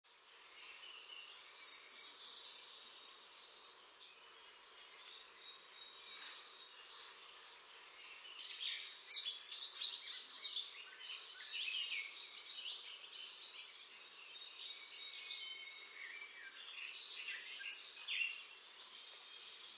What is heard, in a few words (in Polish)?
PELZACZ OGRODOWY